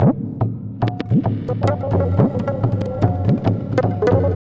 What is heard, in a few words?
Tabla, 110 BPM; Zero-G; Pure Tabla